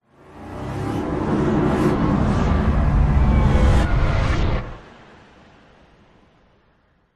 futuristic vehicle passby animation particle swish swoosh accent logo sci fi futuristic; alien